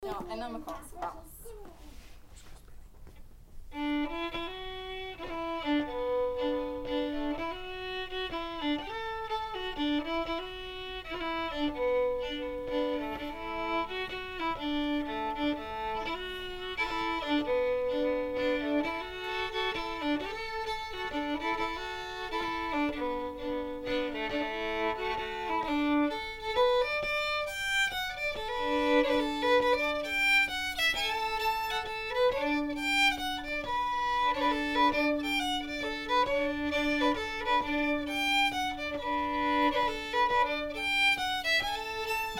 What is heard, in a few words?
Swannanoa waltz